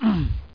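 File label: player pain; abuse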